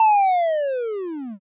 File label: slowmo